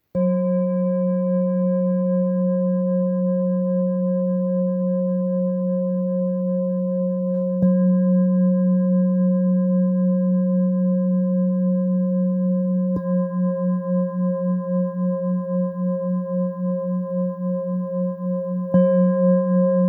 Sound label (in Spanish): Fa 183 jhumka, cuencos tibetanos